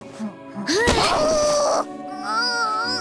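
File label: kick; 少女终末旅行; 소녀 종말 여행 음원팩 (FL); Syaro Sample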